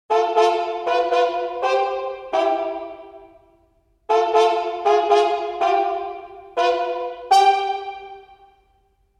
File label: Orchestral Trumpet Plunger; Orchestral Tools Glory Days